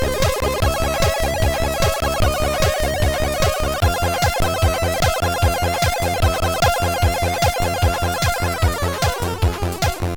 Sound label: main fx; Synth Pack